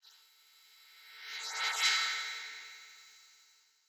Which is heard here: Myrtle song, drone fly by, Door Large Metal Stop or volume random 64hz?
drone fly by